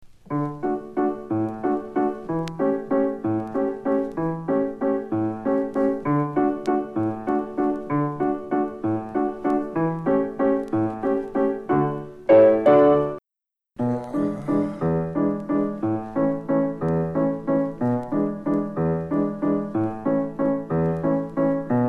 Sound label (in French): Accompagnement avant voix